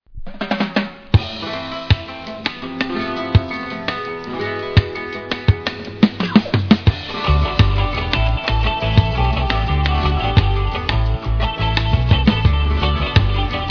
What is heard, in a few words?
beat